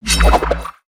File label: Samurai Spin; Valiant; aronwk collection; ndaudio